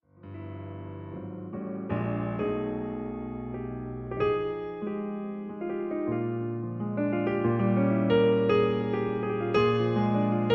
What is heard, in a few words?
piano